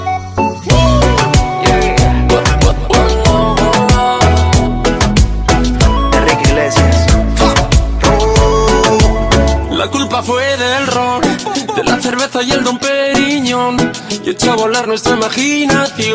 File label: alien music